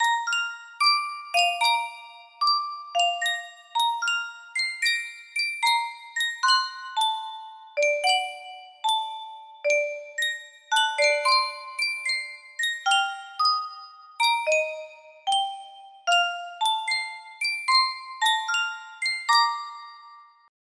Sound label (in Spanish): Yunsheng caja de musica, music box melody